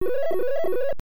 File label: Coin; Bram Stoker's Dracula; NES